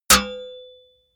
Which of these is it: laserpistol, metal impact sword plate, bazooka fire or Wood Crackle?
metal impact sword plate